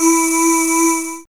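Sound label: synth voice; SYNVOX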